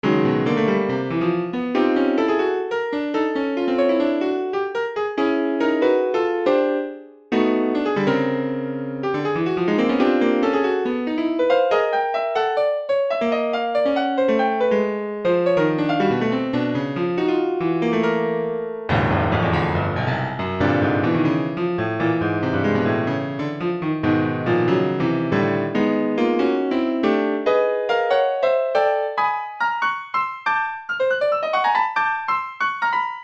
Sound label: 12 tone